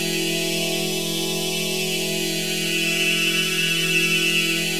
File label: Pad Loop E, shimmer and sparkle